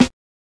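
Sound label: snare drum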